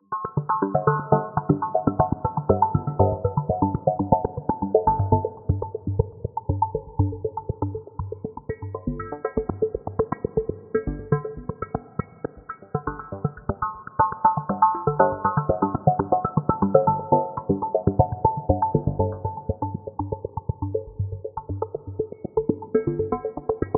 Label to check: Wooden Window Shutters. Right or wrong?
Wrong